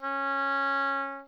oboe mf C#4

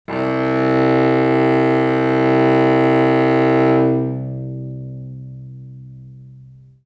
Cello C2

